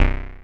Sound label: OB C2 -R, Rare Synthesizer; Hollywood Edge - Giorgio Moroder Rare Synthesizer Collection; OB 4 VOX.